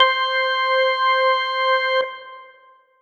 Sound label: Roland D-550 Organ C7